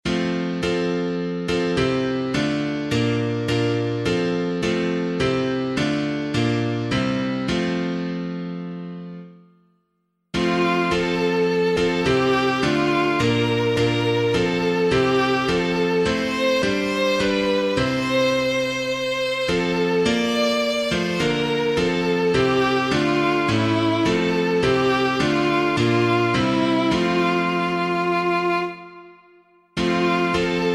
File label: When All Was Formless Dark and Void, WINCHESTER OLD, piano, hymn